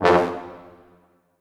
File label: Orchestral Brass